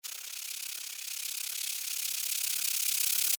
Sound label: Insect Wings Fly, Insect, Wings, Fly, By, Bug; Land, Shake; Wing Sound Effects; Wings (Sound Effects:Animals:Insects); Blastwave